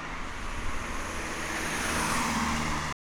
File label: car drive by rev pan